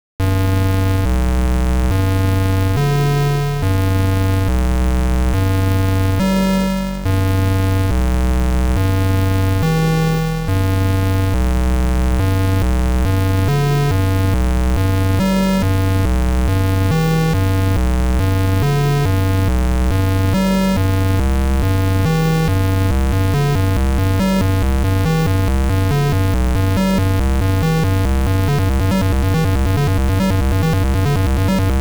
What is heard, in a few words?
guitar